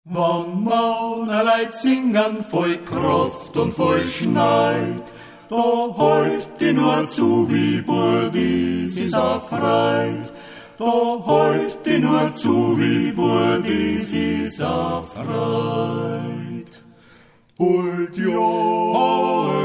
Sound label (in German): maennerquartett